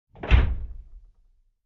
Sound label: Truck Back door close